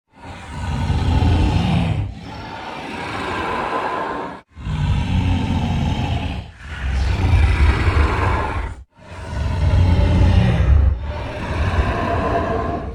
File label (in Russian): zvuk dyhanija drakona